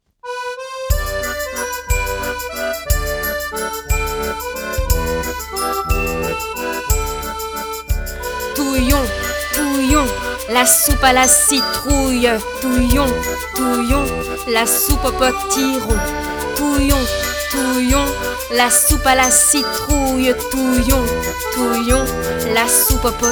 la soupe à la citrouille, version chantée

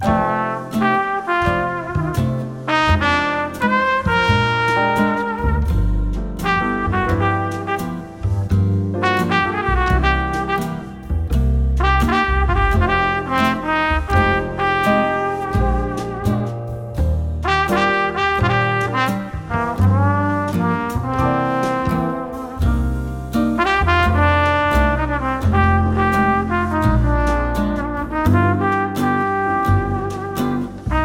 Musiques lentes; Musique lente 2

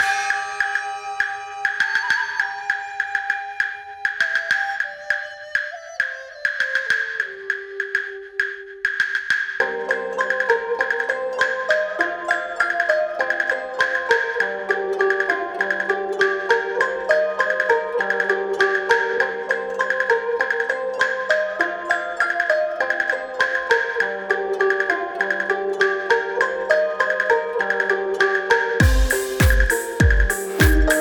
Vive le vent PB sans claps

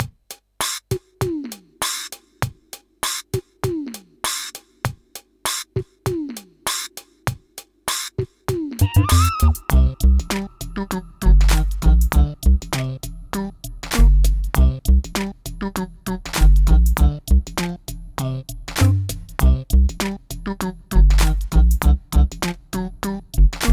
Vive le vent PB sans claps

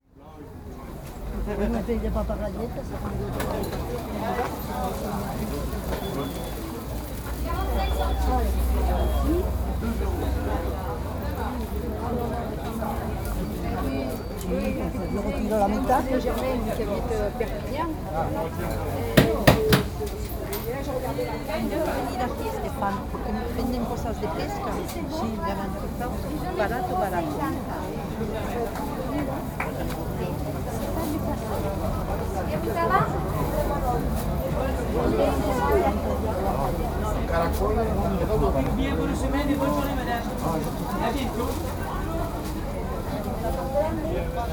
Marché, Les lieux

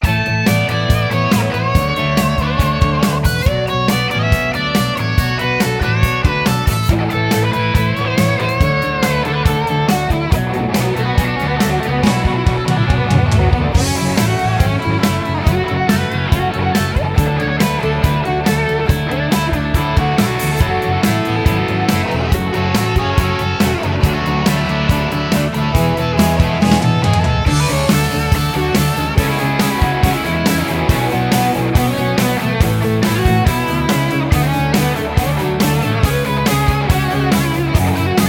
Musiques rapides; Musique rapide 1

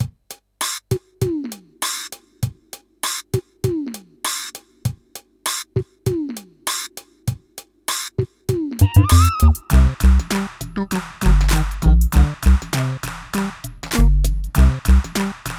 Vive le vent PB; Dire une comptine sur un fond musical SLAM

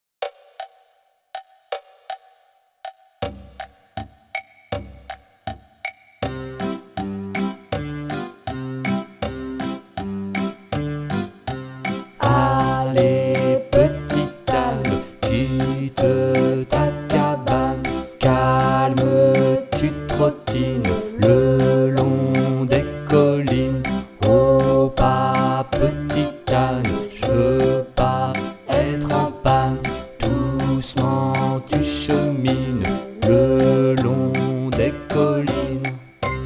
Le long des collines chant

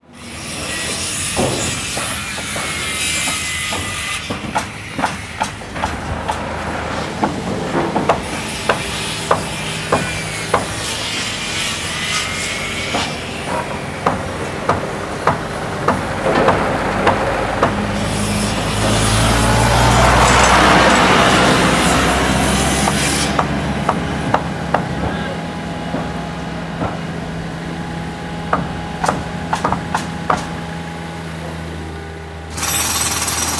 Chantier, Les lieux

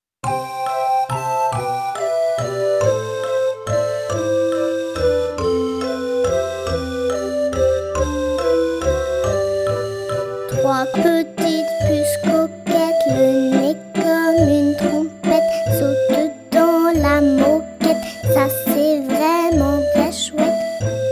Valse du tapis chant, Version chantée

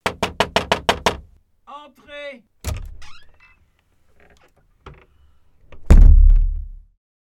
Les animaux malades playback

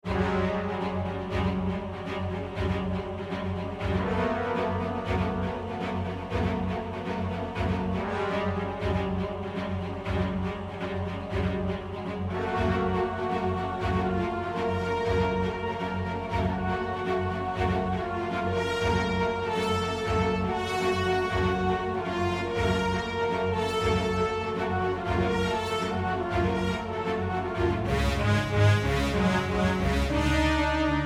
Peur; Peur 3

